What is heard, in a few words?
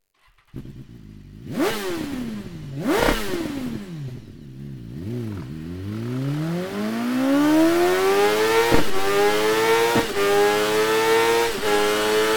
slip on wo insert; hrc